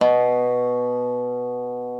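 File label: reference pitch dobro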